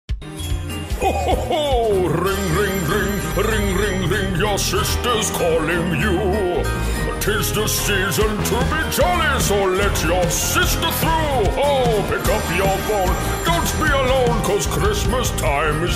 christmas ringtone we wish you a merry christmas christmas music